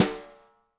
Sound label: Snare, Roland TD-20